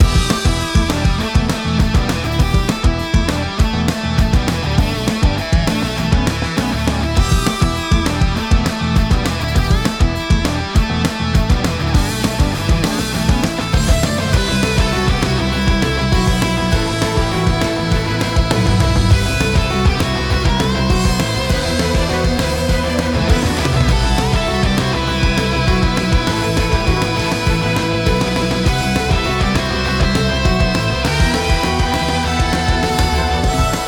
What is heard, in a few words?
Battle Music Loop